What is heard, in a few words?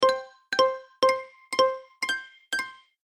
Cartoon Elements Harp And Xylophone; Cartoon Music Sound Effects